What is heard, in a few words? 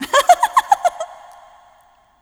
laugh